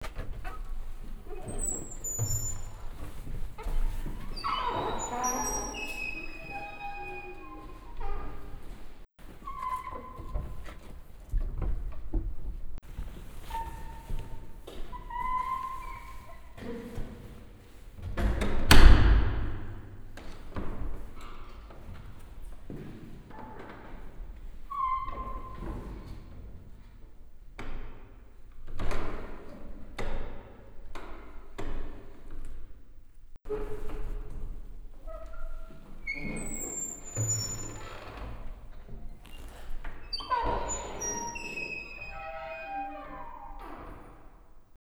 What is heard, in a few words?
Echoey Squeaky Door Innenhof Berlin; Sound Scapes